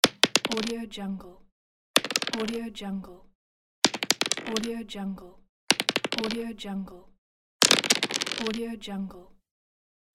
dice roll

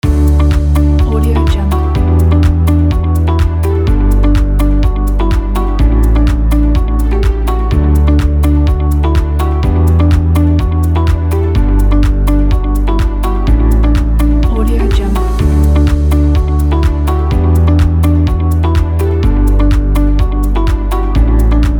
corporate loop; duoflame

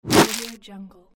delete

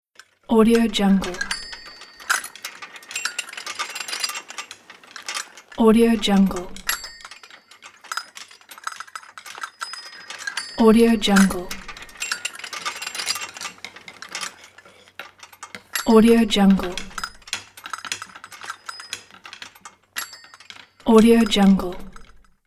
bicycle rattling

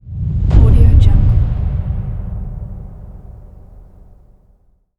distant whoosh boom

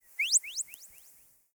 electronic beeps alien birds chirps; prosoundfx

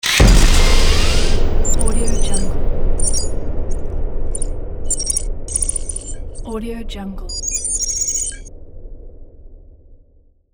cybernetic logo

